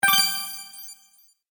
Bright Magic Bonus, video game

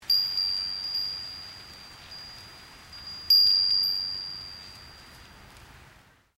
Wind Chime Japanese Bell Type; Various windchimes; Musical

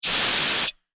Industry Air Can Expel Single Burst; Hisses; Objects

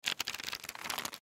Note Pad Flip Through Pages; Offices; Blastwave

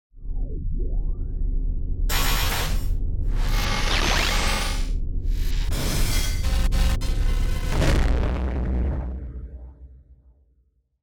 Distortion Left To Right; Distortion Stutter Left To Right To Left; Distortion Glitch Stutter Bursts Production Element Imaging Element Accent Transition

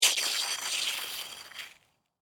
Ice Object Roll, Across, Ice; Texture; Icy, Snow; Rolling; BlastWave

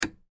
Television Channel Change Antique Click; Television Pull Switch Turn Dial; Historical; Technology; Blastwave

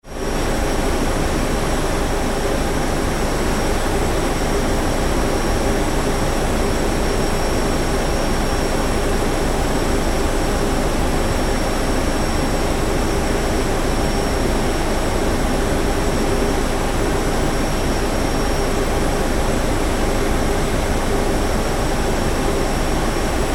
Ship Large Car Ferry Machine Control Room

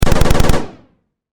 Science Fiction Pulse Rifle Short Burst; Battle; SFX Bible

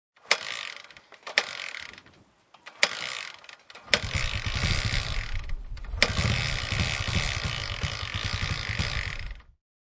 No Start Dirt Bike

